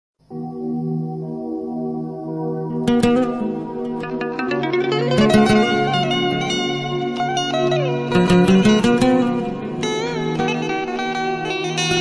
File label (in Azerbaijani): gitara